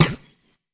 fx drum, Electro FX

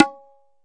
ROLAND SPD-20 PERC TABLA

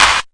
gated clap, drums single hits; Claps

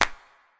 real verb real; clap; Claps

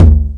distorto tom

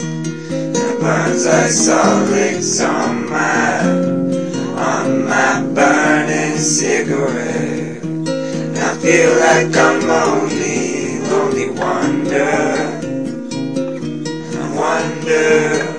120, Folksy Guitar and Voice; REMIXABLE COLLECTIONS; Stems

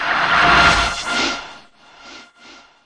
Electro FX; Ueb HE Electro FX

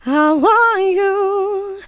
female "i want you", FEMALE SINGING; VOCALS and SPOKEN WORD

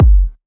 melbourne bounce Kick; Hip Hop; STYLE KITS; D3ron Melbourne Bounce Kit

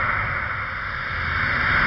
panning noiser; Atmospherics

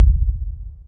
low breath crackle; UNUSUAL KITS and FX; Atomhard Electro FX

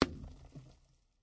snowball glass, Throwing Snowballs; UNUSUAL KITS and FX

